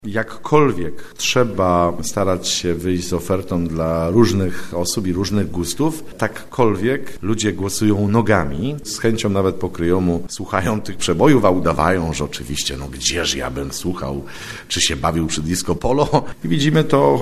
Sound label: disco